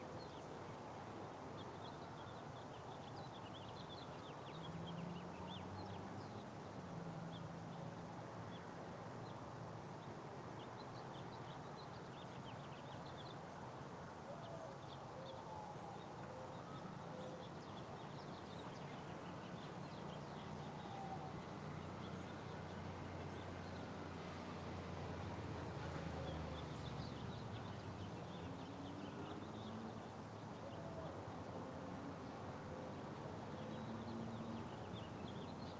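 exterior day park empty playground distant traffic siren heavy birds; fuma; sonniss gdc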